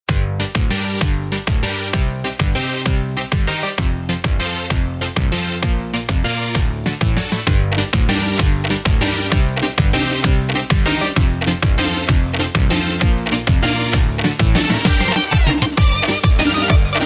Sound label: Instrumental Version (Remix)